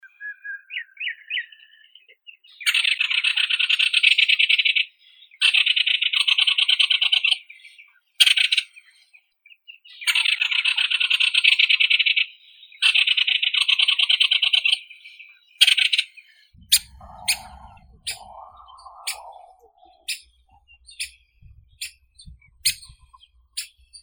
dyatel belokrilii dendrocopos leucopterus

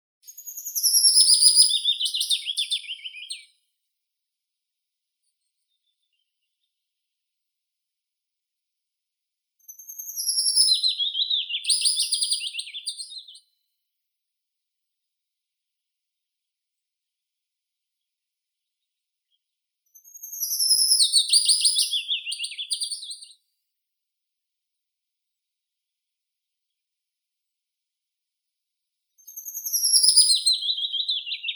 penochka vesnichka phylloscopus trochilus; zvuki prirodi

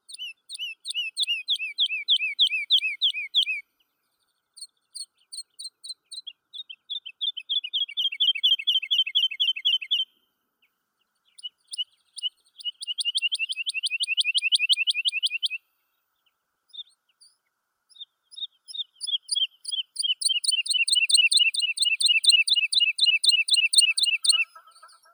zhavoronok lesnoi lullula arborea, zvuki prirodi

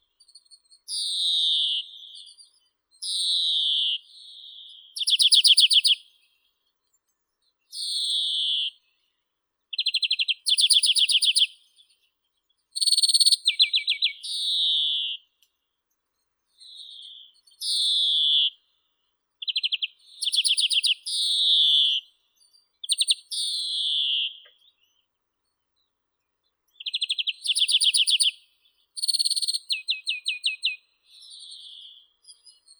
zelenushka obiknovennaya chloris chloris

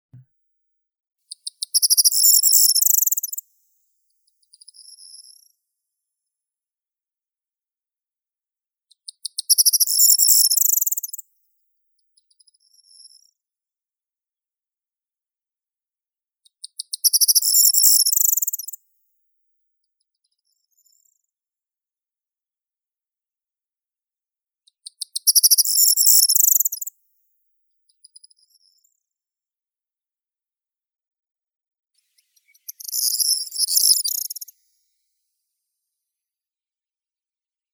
prosyanka miliaria calandra, zvuki prirodi

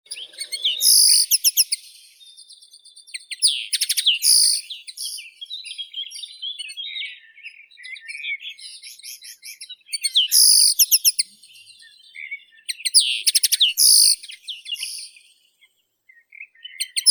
konoplyanka acanthis cannabina

